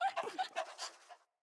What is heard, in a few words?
Kubrow Puppy Idle Pant Grunt